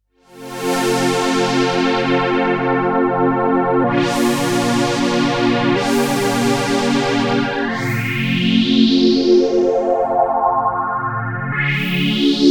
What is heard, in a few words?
Analog Strings; Whirl